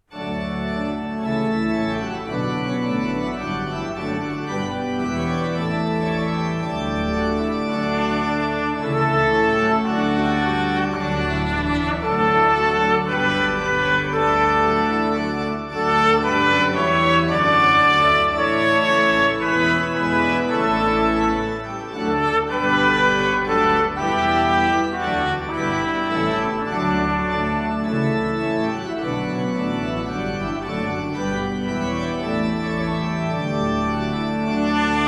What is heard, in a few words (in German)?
Trompete und Orgel; Krippenspiel Einzug der Könige